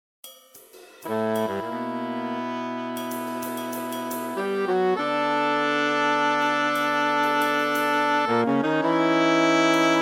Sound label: Pad 5 Layer tuned